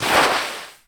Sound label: Baseball Slide Dirt